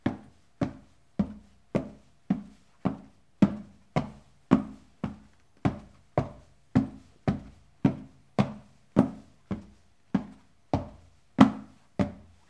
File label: Wooden Stairs